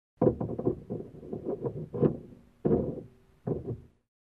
Noises outside car

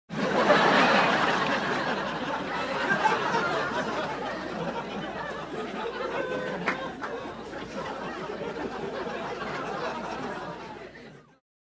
Crowd in a bar laughing

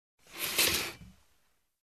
Bright moving rattle

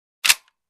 Inserting pistol magazine

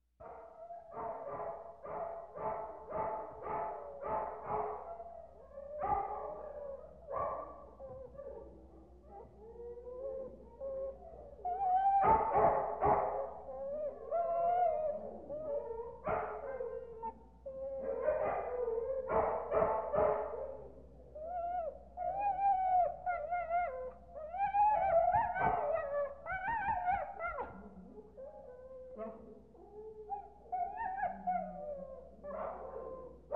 Dogs barking in whining in distance at kennels

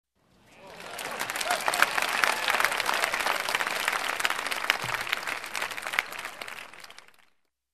Sports Team scores applause

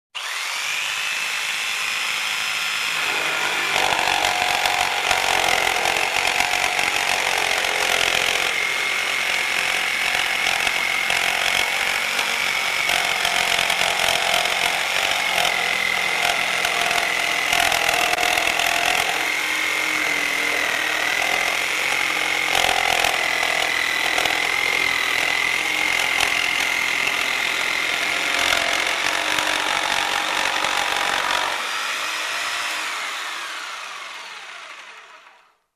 Electric Hand Cutter in Action

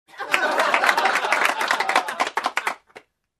Crowd Laughs and Claps

